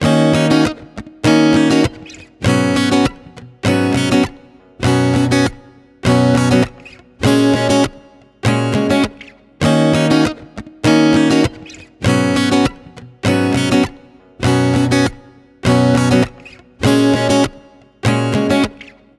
Guitar 100 BPM (D#maj); Bane; Ghosthack; Guitar Loops & Shots

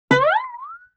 Guitar Plink Slide, Vintage Cartoon; Musical Elements

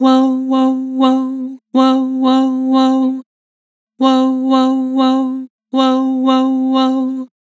Vibrato "Woah", Vocals; Harmor Vocals

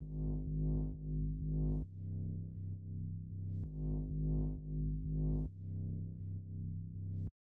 132 BPM C Min Bass, Trap; Stems; Cymatics; Frequencies; 2022 Melody Collection (BETA)

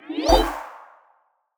Gravity Switch FX FMaj7; Video Game; Cymatics; Video Game Sample Pack Vol 1

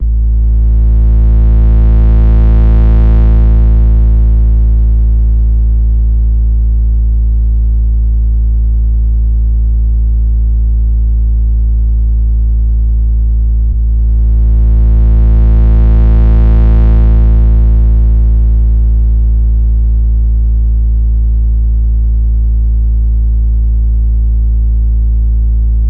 Sub Bass Short Am 70Bpm; Construction Kits; STEMS; Ghosthack; SkyRealm; Kit 20 - Sea Of Souls

